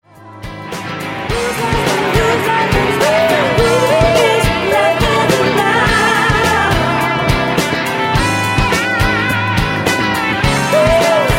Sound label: laughing